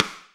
Rimshot, Snares

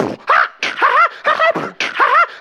laffin, Beatbox, Drum loops

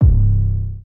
Kicks; Heartbeats

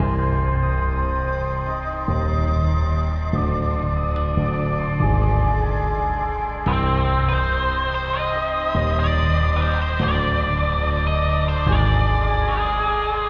keys ominous, Sad Loops

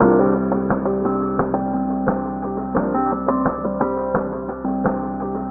keys, phonky loops

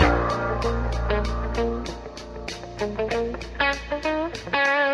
guitar, Loops; Phonky

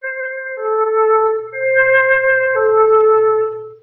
flute, Loops

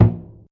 surdo, toms; Real

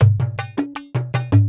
farty party; Drum loops

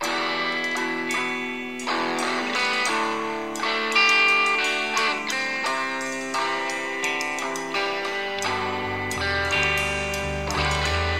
guitar, Loops, Sad

